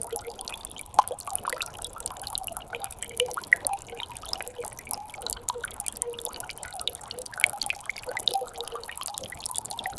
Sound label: gentle bamboo water fount